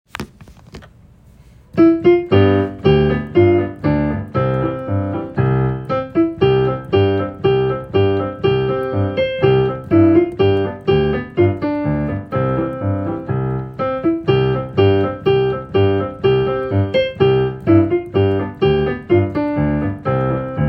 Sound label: Marines Hymn Piano; The Marine's Hymn; 3rd Grade Music Class